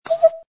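vozdushnij effekt korotkij dvojnoj, air effect short double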